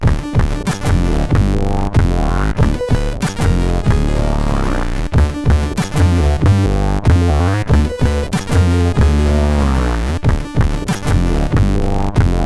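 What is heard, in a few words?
Breakstep, MOS 8580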